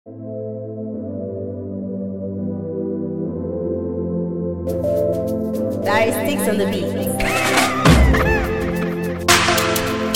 Slow Afrobeat Instrumental

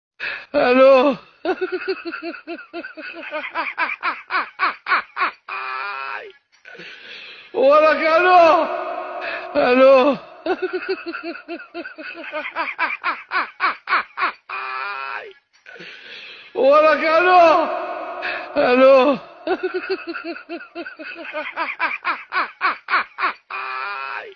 Funny laugh; Comedy

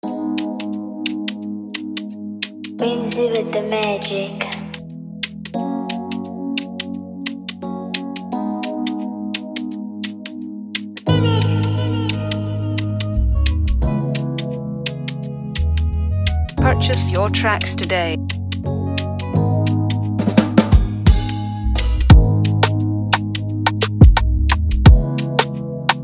Afrobeat Instrumental

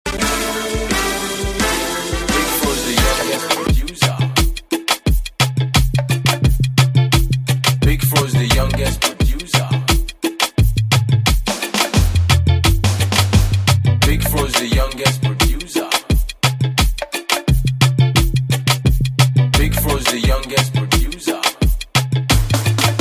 Instrumental

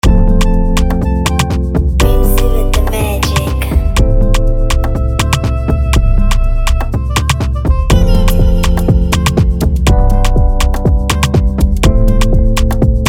Afrobeat Instrumental

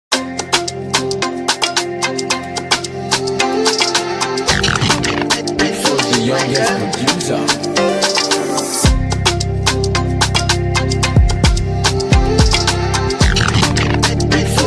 Instrumental

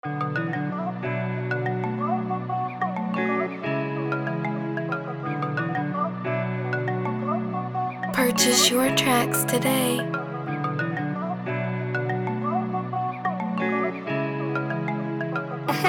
beat 92bpm A major

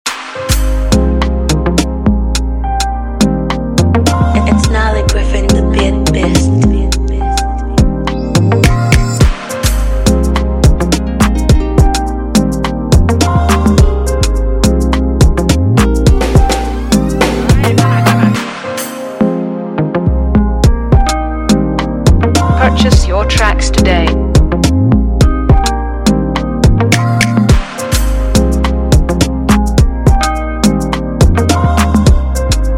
Soulful bouncy Afro beat

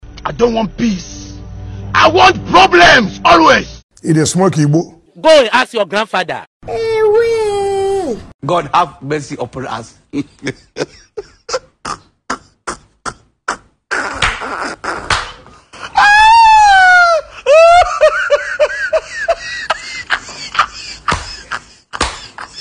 most used comedy sound effects

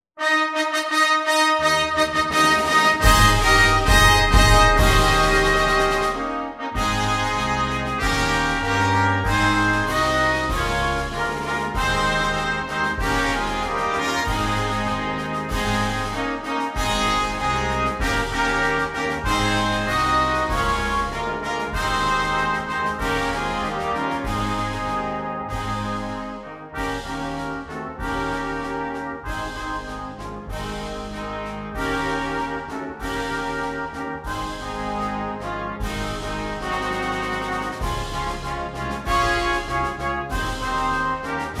National Anthem; American Samoa

